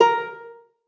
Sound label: lyre